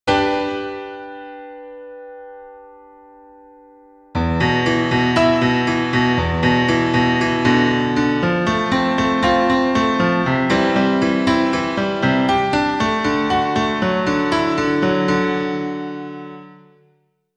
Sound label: Piano